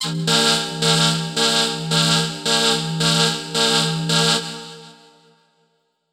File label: Verb Proc, alarm siren, 110bpm; musicradar